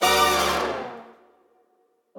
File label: Flashover